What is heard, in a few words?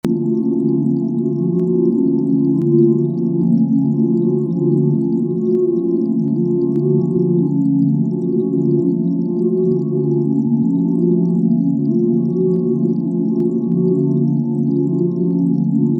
synth grainbrain; grainbrain by xnullx